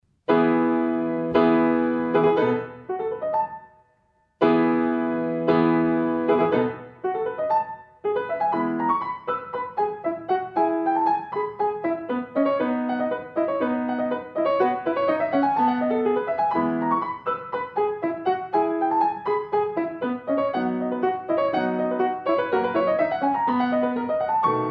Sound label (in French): piano seul